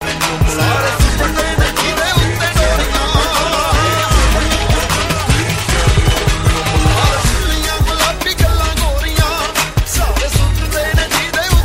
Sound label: punjabi ringtone